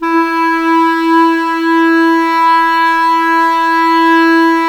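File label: clarinet, Woodwinds; Sample CDs; Miroslav Vitous; Woodwind Brass Ensemble; Banks W&B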